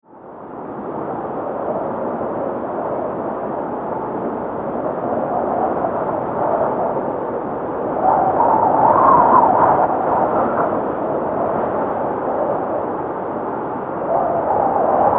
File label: Wind Storm Cold; 5.1